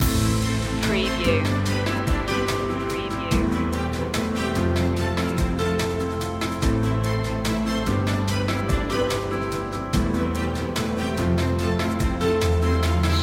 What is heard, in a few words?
reaching a goal loop